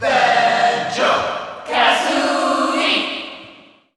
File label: Cheer Dutch; Banjo & Kazooie; SSBU